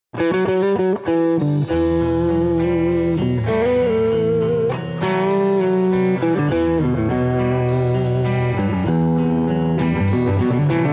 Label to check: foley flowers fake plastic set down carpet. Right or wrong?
Wrong